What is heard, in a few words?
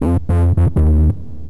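synth bass, drum and bass, 160 bpm, C; eJay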